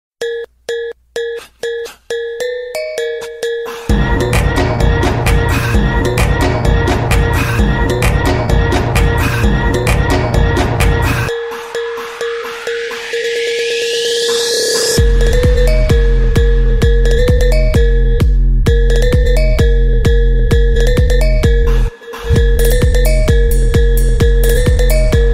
zil sesi trap remix

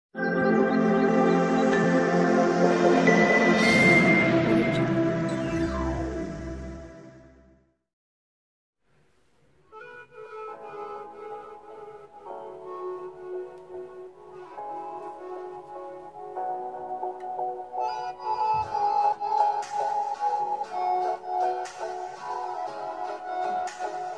flut

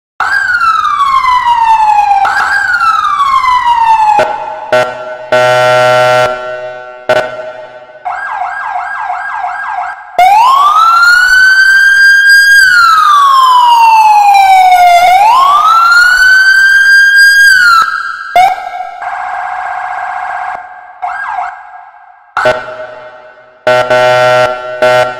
siren sesi cikaran ambulans; ordulu

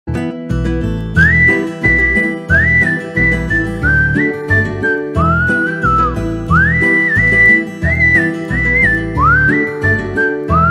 muzigi zil sesi telefon zil sesleri; mucize doktor

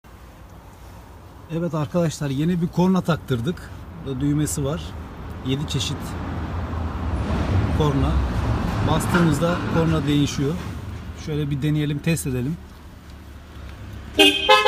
havali korna arabada 3 cesit sesi

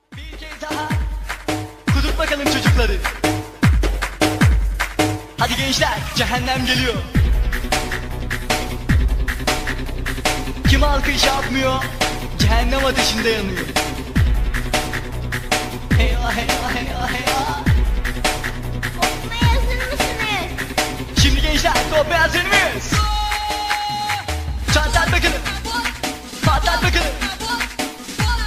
Bip Bip Boo Roman Ritim

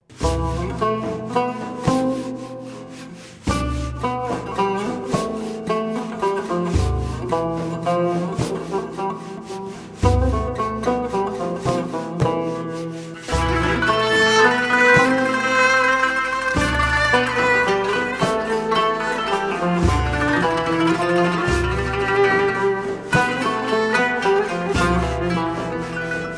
enstrumental; zor yillar; hatirla sevgili; kalan muzik

